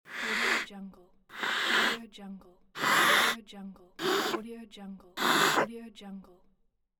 male nose sniff

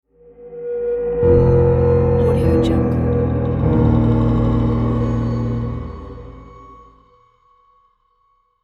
dracula logo; novaaudio

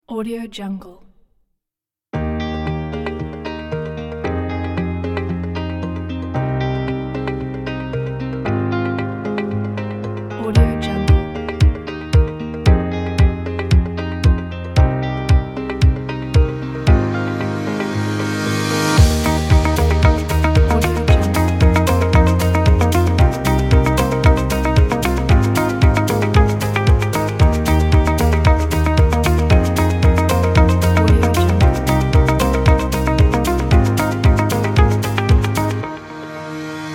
inspirational; williamproduction

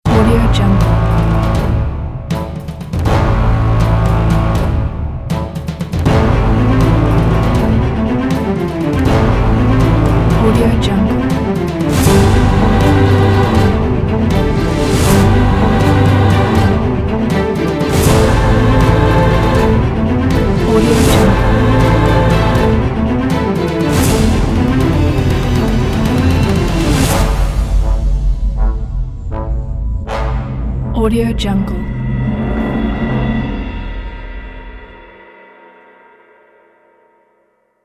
cinematic intro

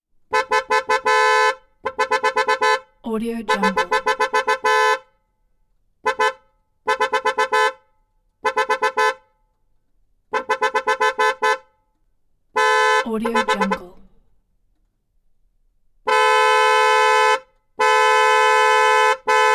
1999 porsche carrera car horn impatient honking; prosoundfx

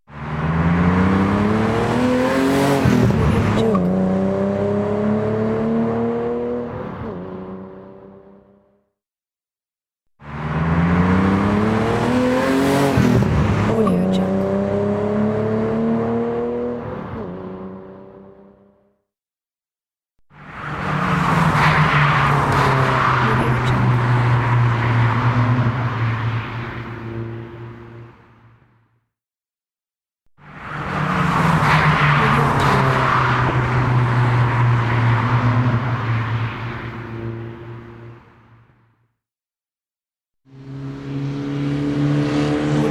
race car pack

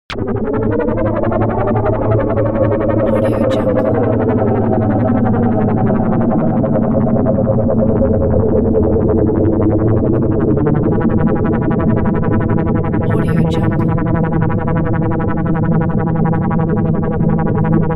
atmo abstract ufo; smartsoundfx

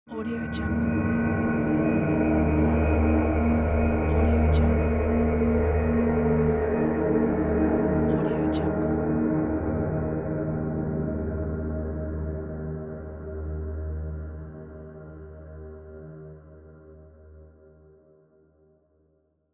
gloomy pad; zombieunit

